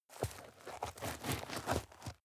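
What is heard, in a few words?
step dirt heavy boots scuff, footsteps; schritte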